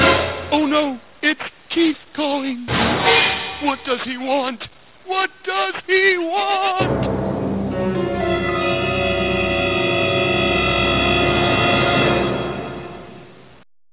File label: Acoustic